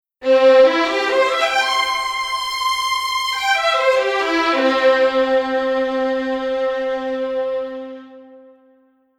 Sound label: Violin